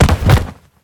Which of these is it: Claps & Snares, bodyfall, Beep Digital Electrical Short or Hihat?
bodyfall